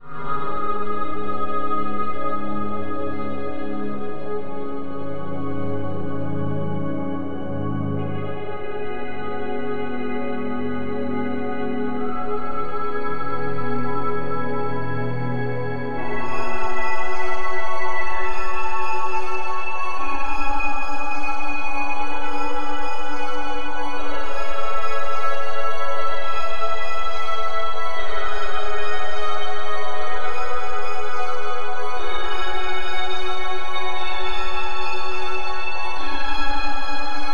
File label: BGM; Adventure Player; psp